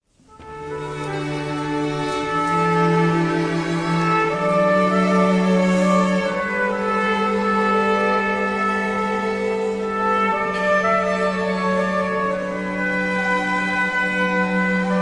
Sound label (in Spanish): Oboe Tromp cuerdas